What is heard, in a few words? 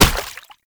Proto Infested Step Water; Warframe; Brachiolyst; Steps